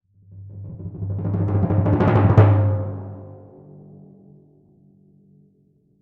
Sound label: Crescendo-hit G, Timpani; PowerFX; Downtown Orchestra